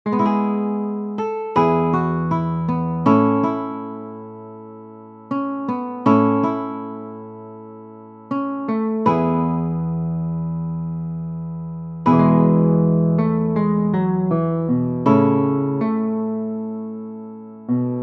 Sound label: Guitar